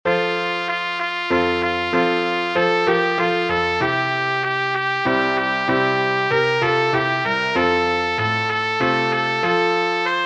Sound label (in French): fanfare menuet